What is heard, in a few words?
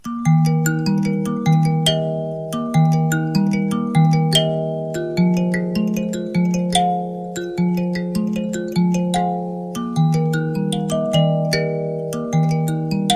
microsoft lumia kalimba; Калимба